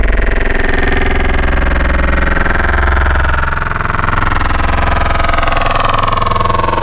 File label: Gurgle, Serum Wavetables; Synthetic's Serum Collection; Cymatics - Nuclear for Xfer Serum Wavetables